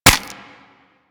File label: Infested Minion Bite; Warframe; Attacks